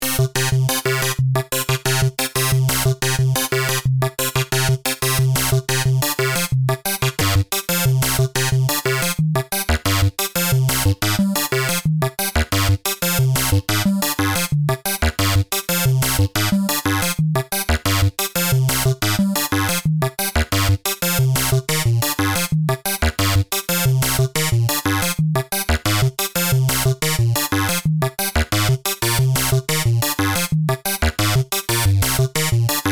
adjust pitches, sequencer, C minor; We have the pitch quantization set to C minor, so any available pitch setting will snap to the nearest note in that specific scale; Numerology; Five12

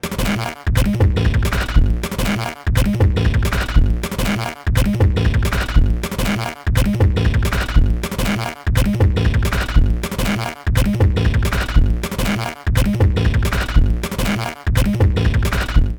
Modular Samples; sampling instrument; Rando by Monkeyc

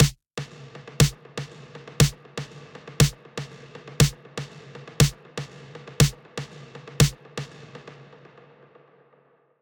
Snare, SNARE HITS; feedback echo plugin; Preset: Don't Call Me Basic; Newfangled Audio

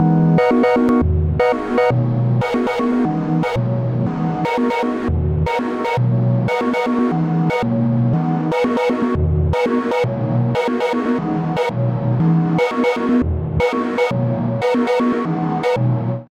Dizzy Sequence

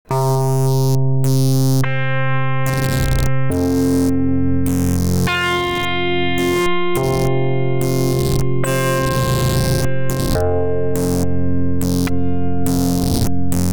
Gtr bender; same guitar run through a variety of different presets that use Nirvana as an effect processor for external audio

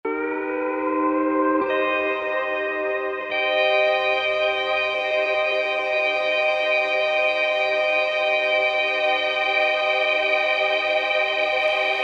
Pro R2 Guitar Freeze

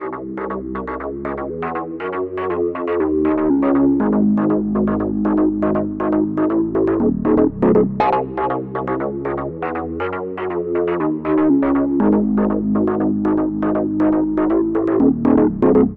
Bassliner; supermodal

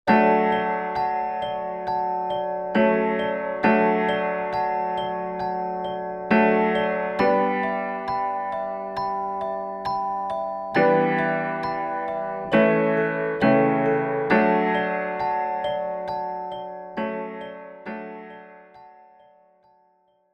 Keys Pultec 5k boost; UAD Spark

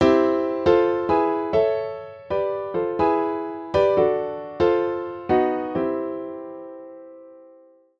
chord trig parallel major triads

